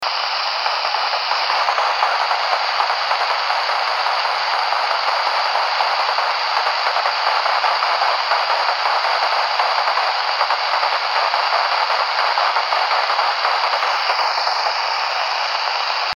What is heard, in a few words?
6m